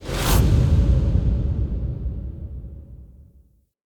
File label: Kuva Lich Requiem Mod Close, UI